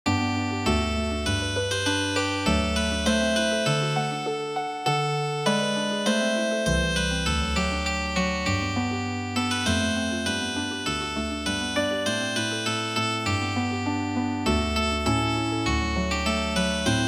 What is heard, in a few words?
Healing Harp, traditional; Hearts ease